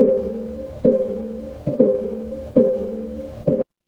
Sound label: mechanical machine